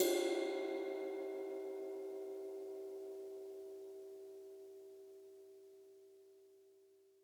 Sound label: Ride